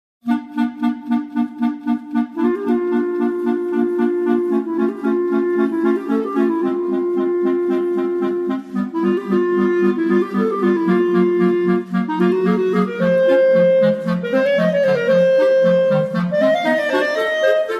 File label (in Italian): allegro giusto